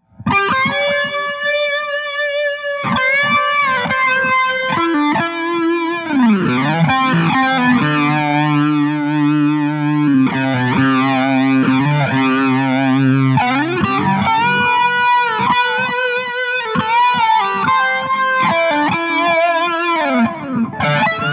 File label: Ram Muff EM OLD TD NB; EH Deluxe Electric Mistress; Nova Delay; without backing track